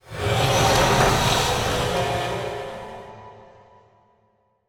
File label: Underlay Attack